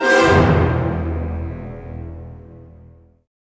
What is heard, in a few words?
wipeout